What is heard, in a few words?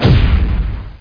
EXPLOSION; Gubble